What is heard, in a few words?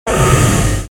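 zombie koe, zombie voice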